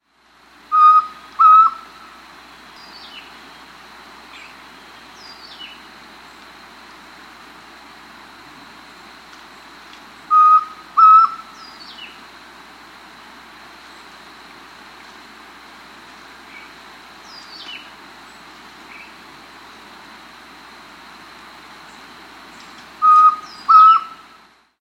White-Throated Antpitta, S. America

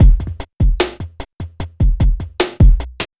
hiphop

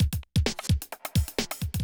electro kit 130

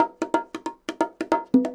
bongos 140 bpm, latin; Sound Effect Library

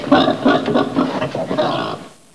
HOG

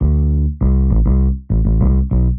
X Bass, Rock Bass

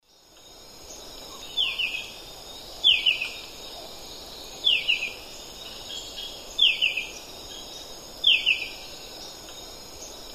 Zimmerius gracilipes, dawn song; S. America; Eastern Lowlands

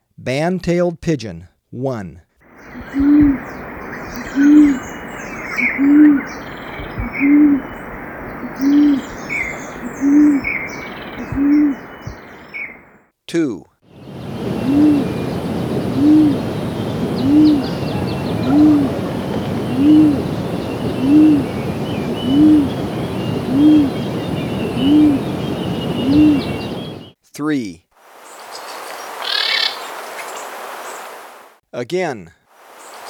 Band-tailed Pigeon; S. America

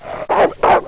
DOG, animal

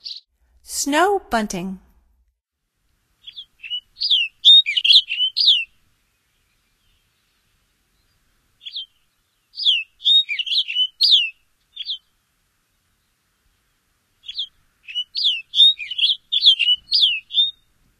Snow Bunting, Songs of Birds of North America; Stokes

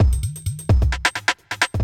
electro kit 130

